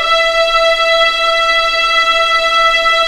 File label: E5 LEG P, VIOLINS; East West; The Ultimate String Collection